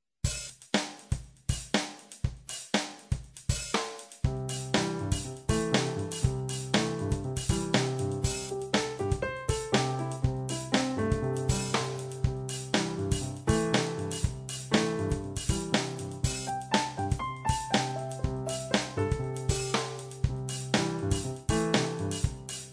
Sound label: Imprv Maj 6th